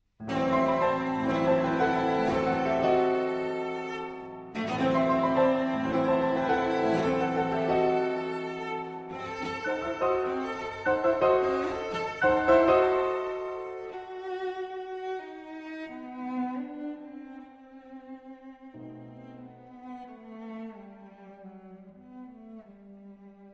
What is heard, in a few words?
Piano Trio E Minor Lento Maestoso; Dvorak; Op 90; Dumky 1